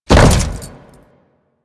Land Default, Footsteps; Enemies; Warframe; Grineer

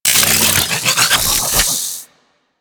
Sniper Rifle Reload; Warframe; Weapons; Corpus

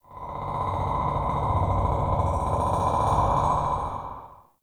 Cave Ocean Creature Far; Ambience; Midrath Island

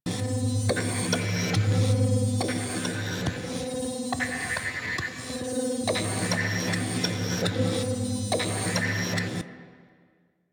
Rail Jack Ambient Machine Loop; Warframe

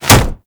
Sword Board Catch; Warframe; Tenno

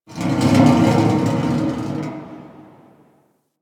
Metal Scrape Amb; Ambience; Warframe; Grineer Galleon

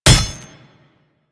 Ghoul Devourer Melee Impact; Warframe; Grineer Ghouls

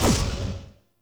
Scrap Container Loop Start A; Warframe; Missions; Zariman; Zariman Assassinate

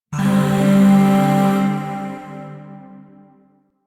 Shawzin Pent Maj Chord B; Music; Chords; Warframe; Zariman Void Shawzin; DE

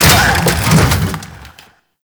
Necramech Ranged Hit React; Enemies; Warframe

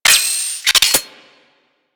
Sniper Rifle Charge Up; Grineer

